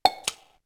Shutter

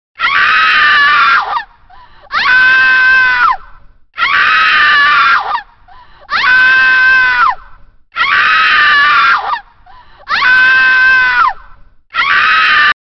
Scream, Retro Ringtones

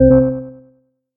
Zirconium; ZTE Quest-N817

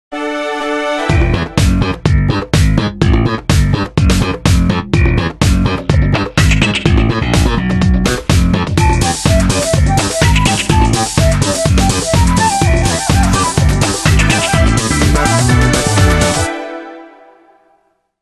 Flute funk, Ringtones; 6111

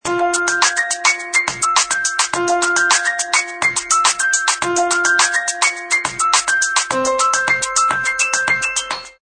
Ringtone; HTC Freestyle

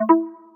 system connected, accessibility; BlackBerry OS-10

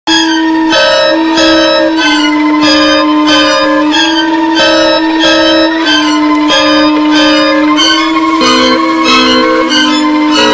String, ringtones; Sony Ericsson

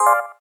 doremi sharp; SKY IM-A730S-VEGA-S; keytone

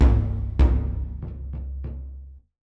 Drum

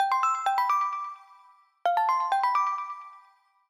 alarms abstract; Amazon; Fire-Phone

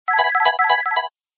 Serene Tone; Samsung SCH-M569

